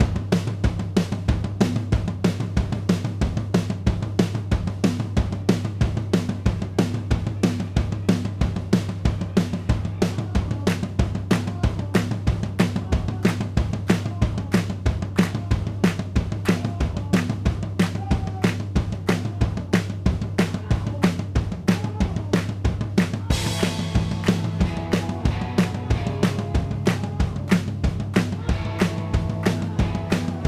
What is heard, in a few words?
drums